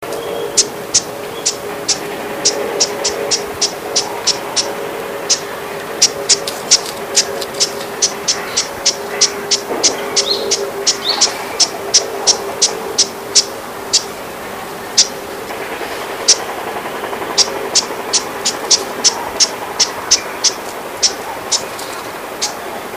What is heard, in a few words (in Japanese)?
uguisu jinaki